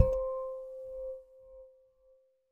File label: top Mirror; hiddencoinsofegypt